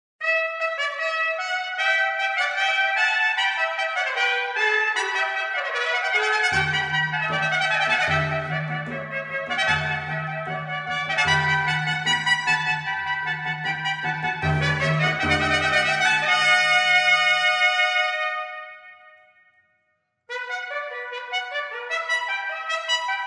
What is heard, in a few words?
Trumpet Voices